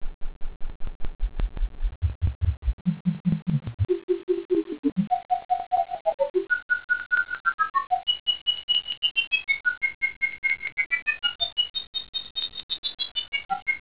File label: tones; wickerhauser